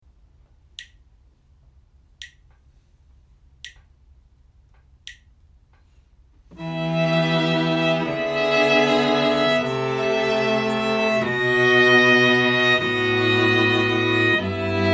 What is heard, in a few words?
harmonic dictation